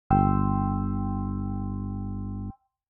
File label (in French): accord sol, basse; exercices